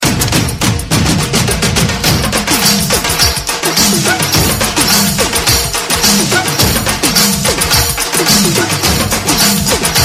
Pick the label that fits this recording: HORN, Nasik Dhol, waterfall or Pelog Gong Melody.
Nasik Dhol